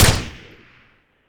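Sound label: shot silenced, weapons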